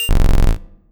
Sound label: swch